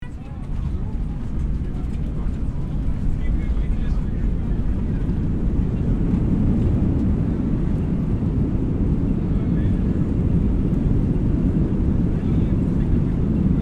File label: Airplane in flight passenger perspective loopable